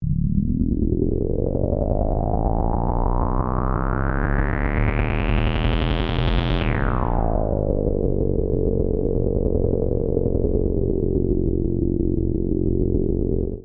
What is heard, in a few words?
synth efx rise